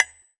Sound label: Ambient Snap, Drum N Bass Percussion One Shot Drums; Sonic Foundry (Sony Creative Software); Knutrix Drum & Bass